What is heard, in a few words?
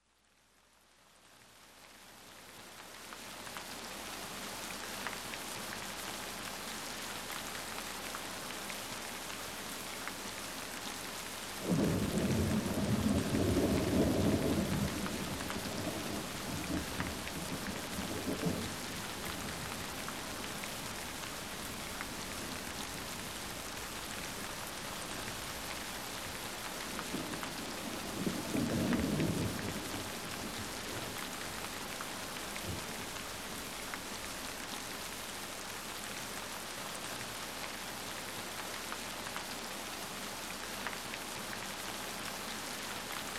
Sounds Of Nature Electrifying Thunderstorms; RAIN, THUNDERSTORMS; relax; Sleep Sounds of Nature